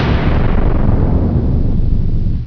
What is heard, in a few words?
metal she; sci-fi sensation